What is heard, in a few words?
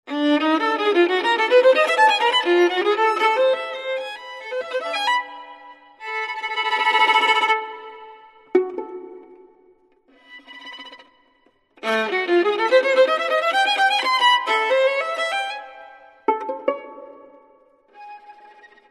Solo